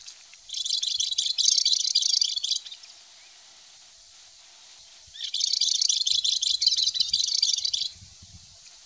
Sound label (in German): balearengrasmuecke